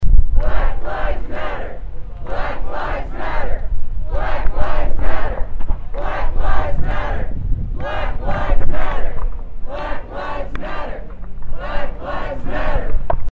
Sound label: Black Lives Matter chant